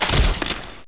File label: die egg